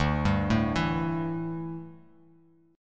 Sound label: D Suspended 2nd Suspended 4th D down; arpeggiated